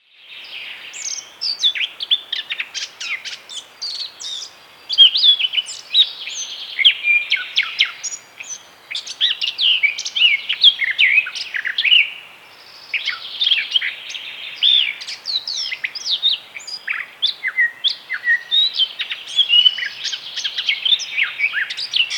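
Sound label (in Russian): chernogolovaya slavka golos, blackcap voice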